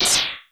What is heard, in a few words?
X Buster Fire